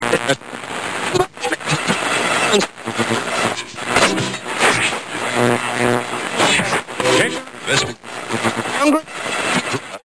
Radio Tuner

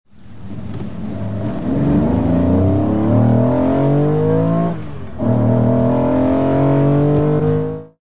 Carsounds Lamborgini

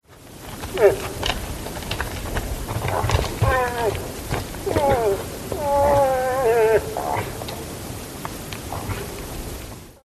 moose

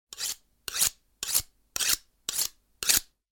sharpening knife

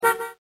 Car Horn

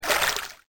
water

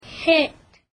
hit

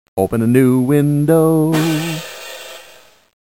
wind

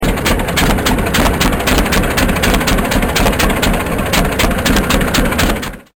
popper

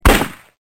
MUSKET

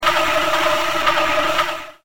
run

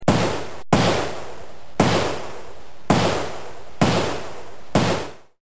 m1 garand

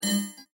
clock

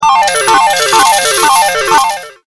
Slot Med Pay

